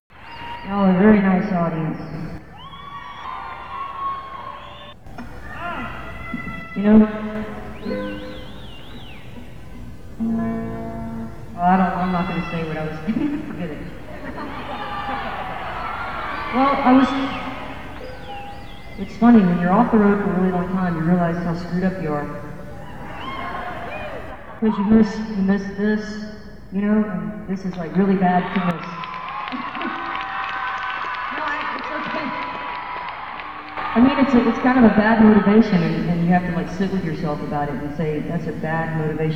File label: applause; market applause (new orleans 1996)